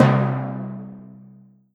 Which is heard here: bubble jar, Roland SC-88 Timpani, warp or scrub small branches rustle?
Roland SC-88 Timpani